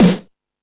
All Snares, E-MU Pro-Cussion; drum machine

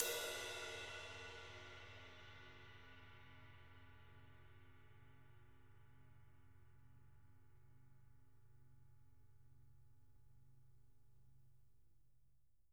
rides

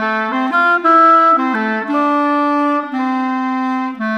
Rock Pop Clarinet Oboe, Orchestral; Sonic Foundry (Sony Creative Software); Orchestral Series VOL-4 - Rock and Pop

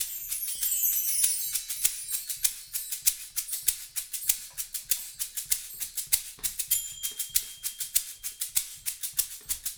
Smooth Groove Shaker Chimes, 70s Analog Funk; Sonic Foundry (Sony Creative Software); What it Is!

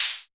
hat, Korg Prophecy

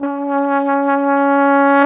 Trombone Effect, effected trombone; Sonic Foundry (Sony Creative Software); Jazz Solos and Sections

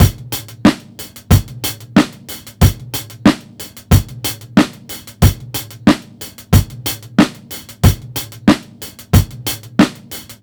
beat, Roots Funk; USB Soundscan vol.41; AKAI

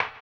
HAMBONE; ILIO; Vocal Planet VOL-2; Blues & Soul

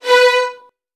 B2, VN 16TH, Virtuoso Strings; ILIO; Kirk Hunter

